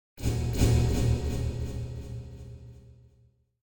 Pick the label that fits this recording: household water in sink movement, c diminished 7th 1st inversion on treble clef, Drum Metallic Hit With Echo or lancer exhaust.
Drum Metallic Hit With Echo